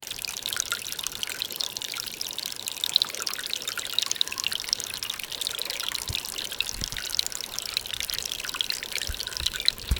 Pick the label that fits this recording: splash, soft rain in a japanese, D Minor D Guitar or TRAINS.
soft rain in a japanese